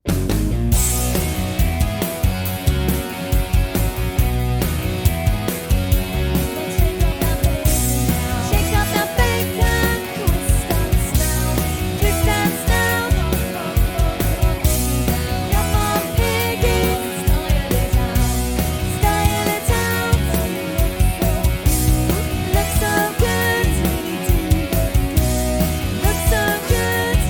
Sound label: HIGHER BACKING